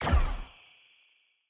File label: shoot